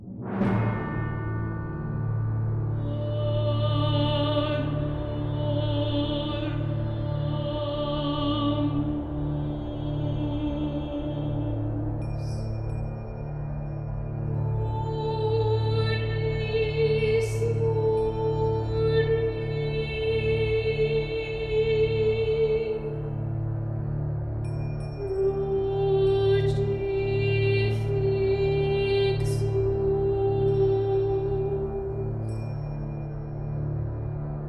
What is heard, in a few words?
Solemn Funeral Pyre; Mount & Blade Viking Conquest Reforged Edition